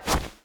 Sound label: Jump Off Ground; gamerip; Mantis; Mantis Lord